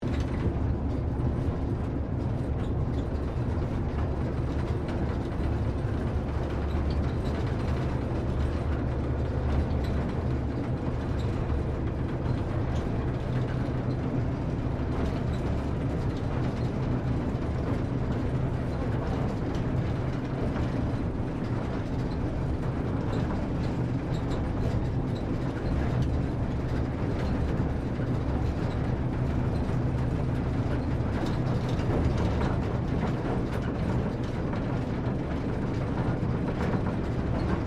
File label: Train Diesel TGR X Class 1951 Tasmanian Operating Cabin Ambience Loop; Bellarine Tourist railway vehicles; Trains